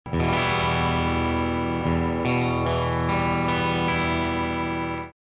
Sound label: Single Coil Bridge, stratocaster loaded pickguard